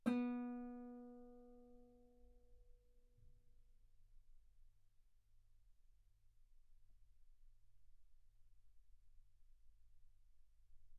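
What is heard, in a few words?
Guitar ff B string B3; sul B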